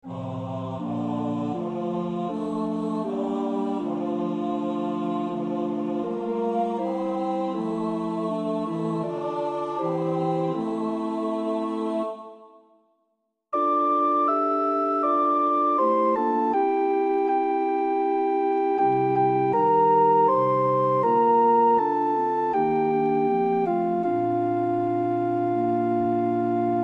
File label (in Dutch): Kyrie (sopraan); Darke (Harold E); Communion Service in F